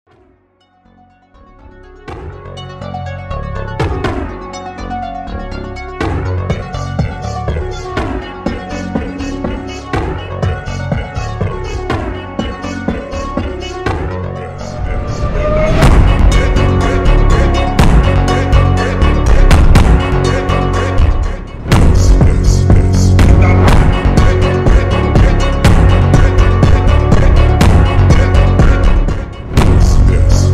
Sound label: brazilian phonk